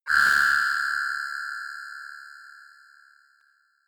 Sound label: notification crackling mystery; signale